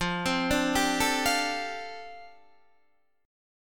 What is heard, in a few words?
F 6th Add 9th F down Guitar DADGAD, chord